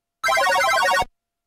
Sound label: pocket piano